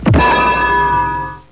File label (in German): klang